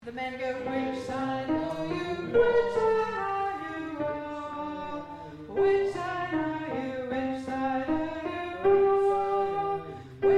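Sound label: Soprano Chorus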